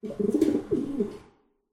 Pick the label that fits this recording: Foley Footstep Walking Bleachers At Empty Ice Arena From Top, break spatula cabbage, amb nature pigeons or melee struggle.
amb nature pigeons